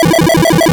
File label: toss, hot potato